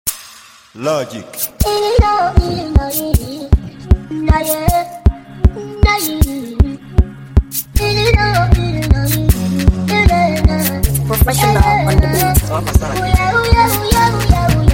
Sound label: Professional Beat; Mara Beat